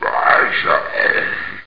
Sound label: goblin taunt; Ferazel's Wand; The Azure Edge.